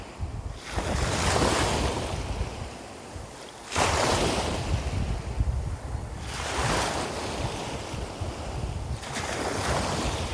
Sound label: Well